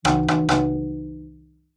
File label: impacts glass large window hit with wood stick